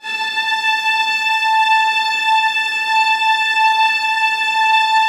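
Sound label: violas sustain a5; Sonatina Symphonic Orchestra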